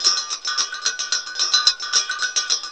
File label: CLANGEROUS; Rarefaction; Poke in The Ear With A Sharp Stick